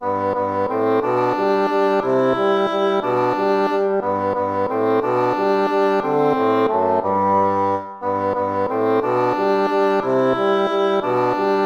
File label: waltz; Carulli Ferdinando